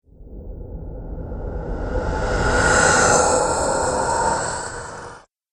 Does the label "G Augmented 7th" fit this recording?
No